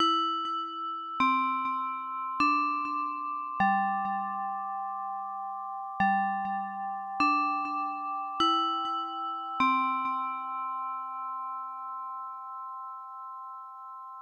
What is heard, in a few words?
Chime; doukyusei 2 ibm pc